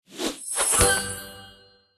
Info In; candy crush saga gamerip

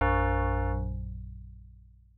Coin Player; arcade; gauntlet

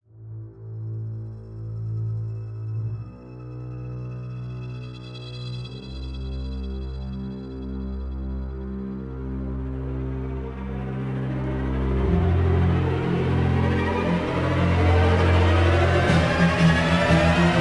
Music; Emergency 5

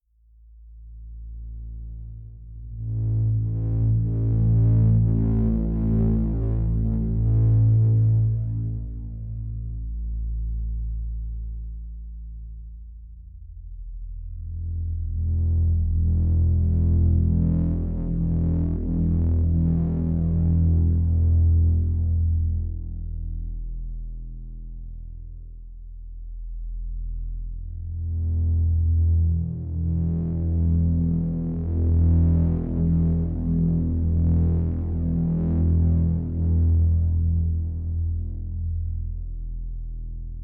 Ambiant

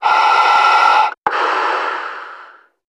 breath slow; gamerip; shattered horizon 2009

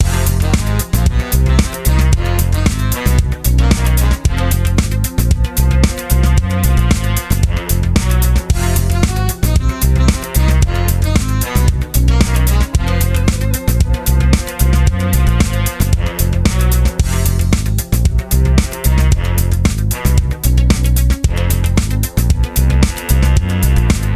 menu music; carmen sandiego adventures in math the big ben burglary 2011 nintendo wii

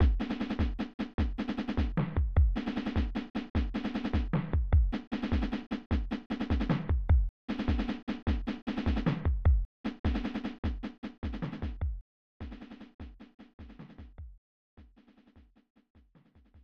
Drumbeat; Turn and Burn: No-Fly Zone; SNES

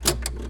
Vintage Click Mechanism; Where Angels Cry Tears of the Fallen Collector's Edition

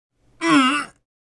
Grunt

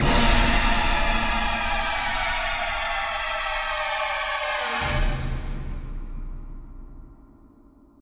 Music Stings stinger; Kragle

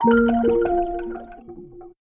ui notif connect phone; Just Dance 2018

